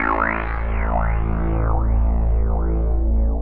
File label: TEKNO 140, Techno; Techno2; Sphere